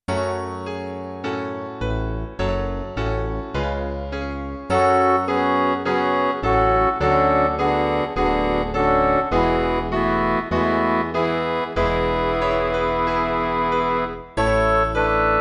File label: midi